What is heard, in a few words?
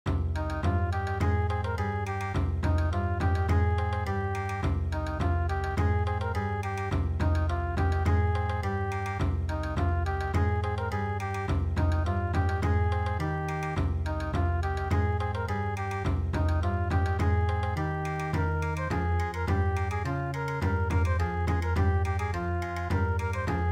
turkish flower Bon Odori